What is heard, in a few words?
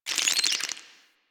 Insect; Warframe; Hub; Liset Alchemy Lab